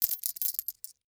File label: Coins Moving In Hand; Foley